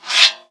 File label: sword ready; blackjack